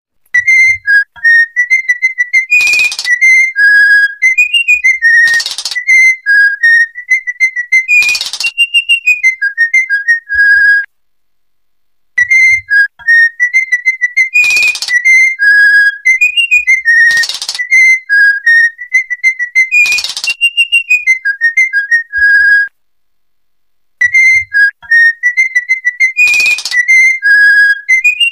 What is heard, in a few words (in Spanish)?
Silbido; Mickey